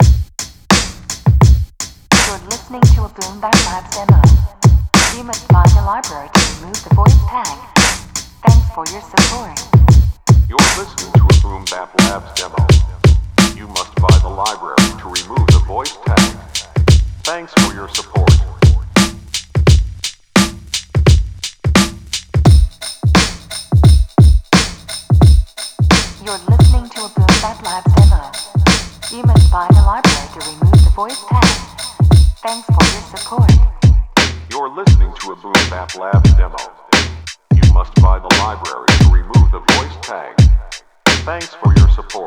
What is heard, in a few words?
dirty drums; redrum